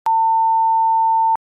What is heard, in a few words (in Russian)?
zvuk dlya skritiya cenzuri korotkij